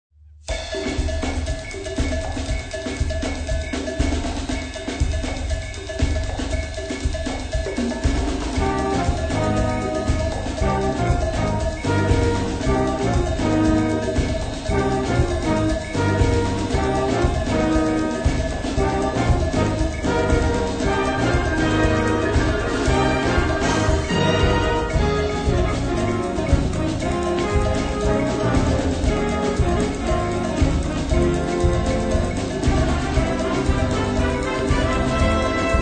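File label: Samba, Band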